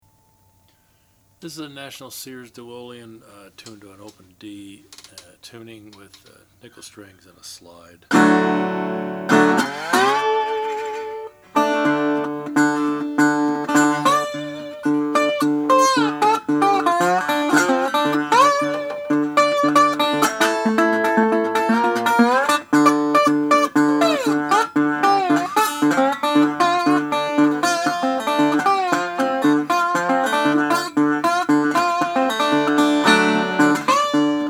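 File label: national sears duolian blue, Guitars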